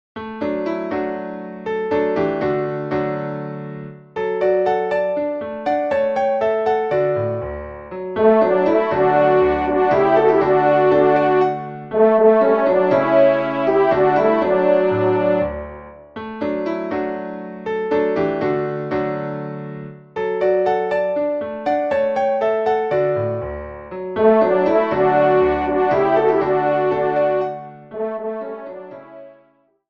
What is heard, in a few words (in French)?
Trompe et Piano; La Haut sur la Montagne